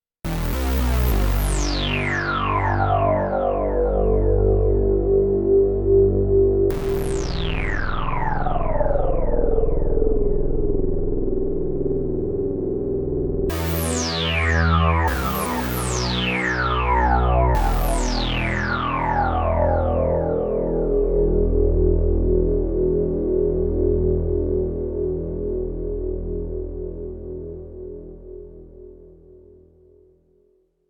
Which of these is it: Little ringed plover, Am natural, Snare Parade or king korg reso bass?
king korg reso bass